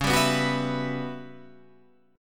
E Minor 6th Add 9th Db chord

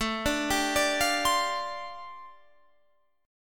G 7th Suspended 2nd Suspended 4th A

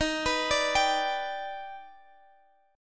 Eb Augmented Major 7th Eb down Guitar Standard; Eb+M7 arpeggiated; JGuitar

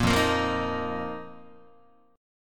F 7th Suspended 4th F down Guitar

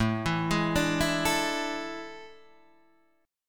A Major 7th Suspended 4th, Guitar, Half Step Down; AM7sus4

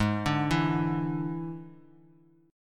D Suspended 2nd Flat 5th G sharp

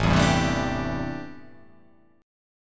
A Minor 11th A, Chord

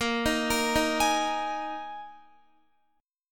A sharp 7th Suspended 4th A sharp, Guitar; A#7sus4; arpeggiated

